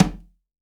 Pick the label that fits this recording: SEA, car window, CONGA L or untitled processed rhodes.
CONGA L